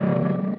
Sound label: DIE